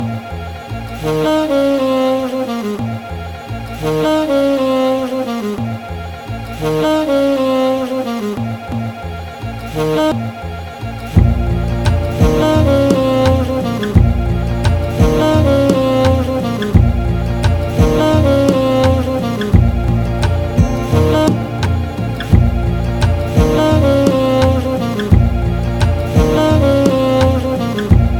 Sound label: instrumental